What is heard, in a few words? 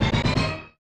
Power Up!; bs zelda; snes